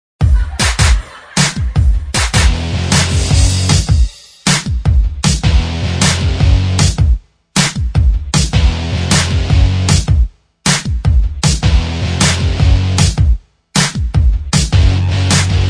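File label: tv theme instrumental track, backing tracks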